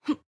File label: Punch; Jackie